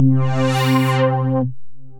Amber Aureolin, Synth Lead; Wavetable; Ableton; Factory Packs; Synth Essentials

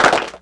Clapbox Pitch4; Ableton Foundation FreePacks

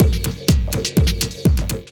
Kit 124 bpm; MIDI Clips; One Function Kit; Ableton; Punch and Tilt

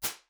Pantry, Tiny Rooms; Convolution; NI Komplete; Kontakt 5

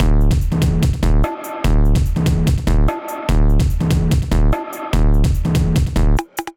Kit Melodic 146 bpm; MIDI Clips; Drums; Youngstar; Skitter and Step; Ableton Factory Packs

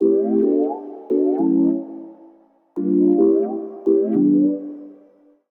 Chord D Major 87bpm; MIDI Clips; Melodic; Bender; Ableton; Factory Packs; Chop and Swing

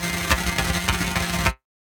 Hum Tunnel; Effects; Ableton; Glitch and Wash

